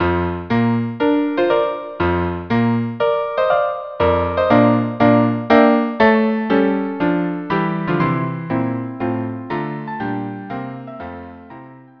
quartet; Fidelio; March

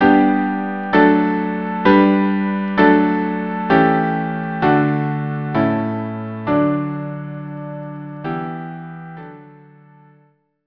choral violin piano; violin and piano or organ; ein choral

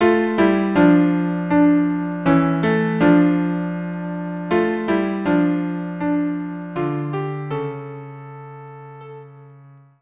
viola cello; eliahu hanavi

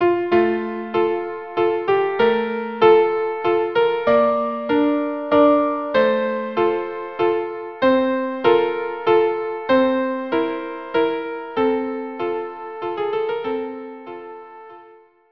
violin duo; Un di felice; La Traviata

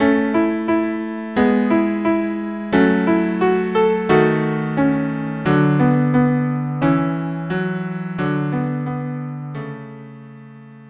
viola duo

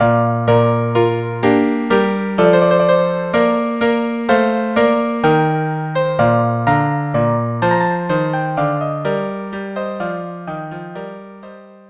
trio Loure; ceph et proc

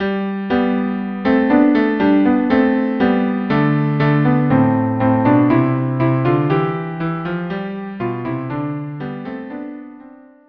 cello duo

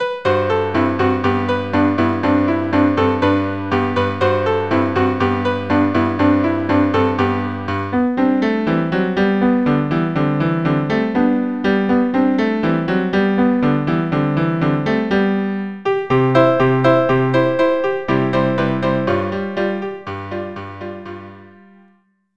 trio; giselle

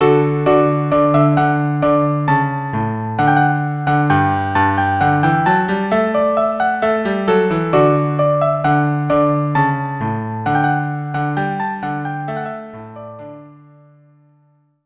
violin cello duo; Te Deum

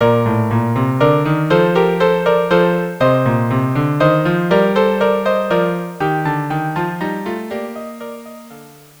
Rondeau trio; Fairy Queen

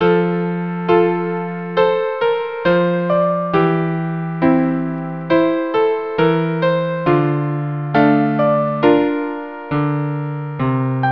arioso quartet

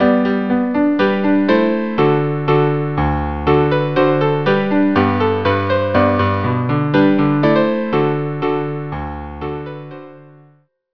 Minuet trio version

